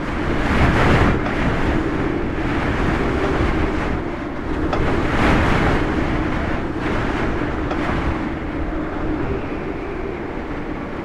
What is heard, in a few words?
wind